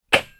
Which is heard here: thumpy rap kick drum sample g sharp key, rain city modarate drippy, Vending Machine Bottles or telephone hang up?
telephone hang up